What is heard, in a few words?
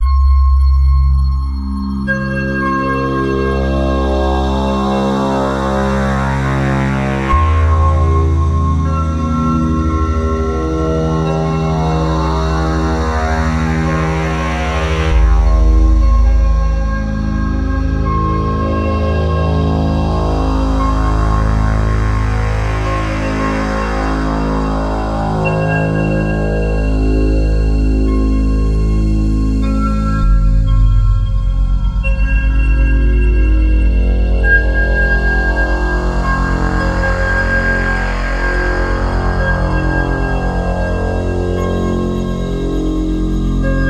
ominous